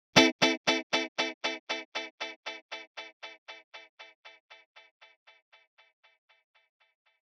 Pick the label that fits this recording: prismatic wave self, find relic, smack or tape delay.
tape delay